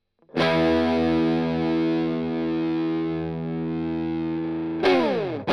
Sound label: Strum Line